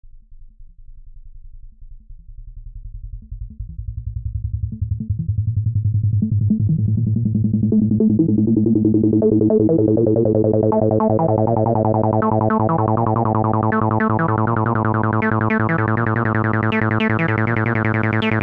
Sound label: Techno Base